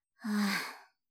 ため息; ASMR; 相づち・反応系【ネガティブ】

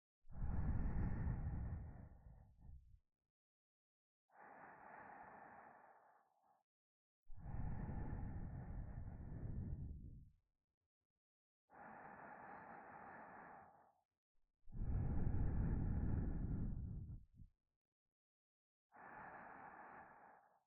寝息で安眠 左耳, ASMR

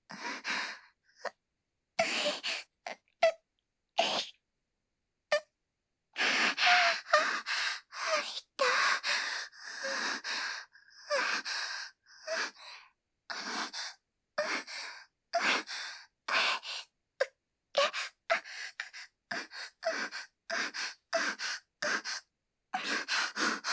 喘ぎ（SEなし）喘息

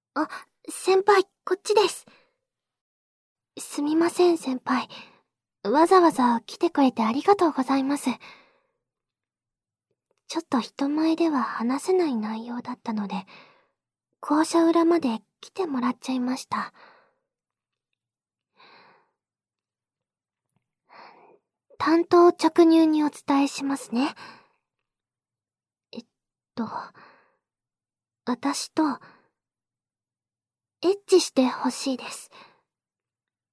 耳舐め, ASMR; 校舎裏で耳舐めされながら乳首射精

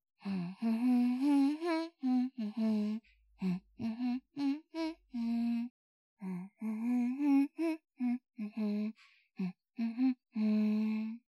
鼻歌

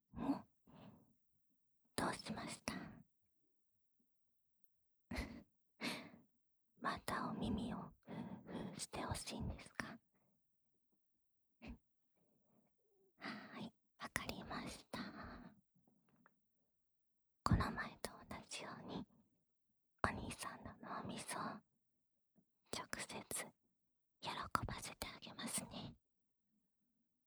安眠用 お耳 ふーふー（左耳）

